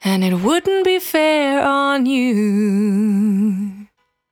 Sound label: Cry 68bpm B, Vocal; Zero-G